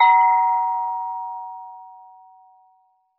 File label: bell